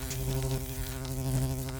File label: Symbol Free Spin Triggered Loop; godsoffortune